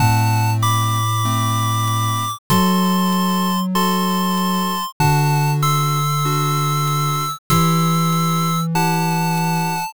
bgm mellow

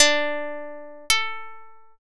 sitar

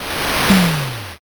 fire magic

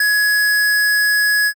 ecg flat

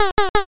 duck

